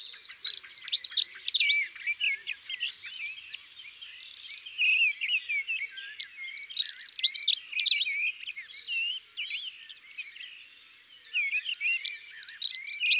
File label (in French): Accenteur mouchet, ABC des chants d'oiseaux